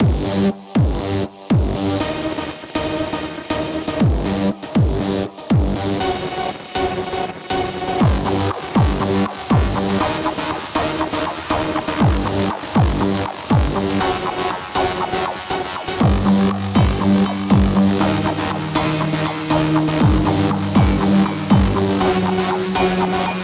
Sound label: Forest Dream